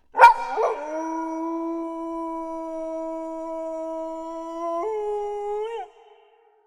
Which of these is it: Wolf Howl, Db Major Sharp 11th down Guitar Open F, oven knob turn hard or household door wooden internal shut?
Wolf Howl